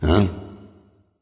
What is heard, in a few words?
confused; smite; poseidon